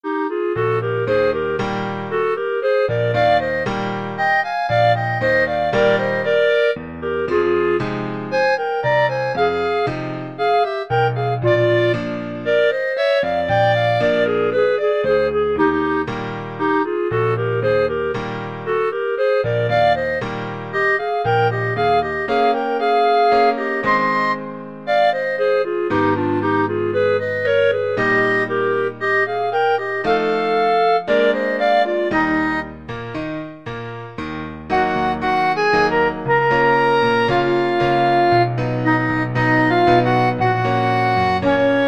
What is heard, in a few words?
clarinet duet